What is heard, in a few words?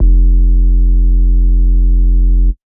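bass sustain F; Polyend; ARC Noise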